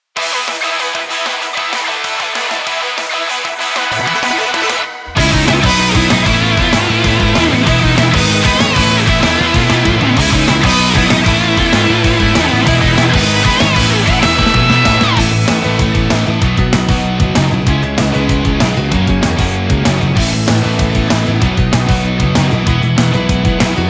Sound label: short ver. instrumental; Record of Myrtus Revival